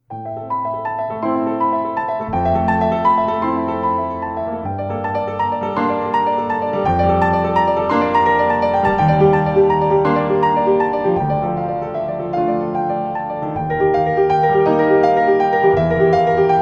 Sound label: Stereo Out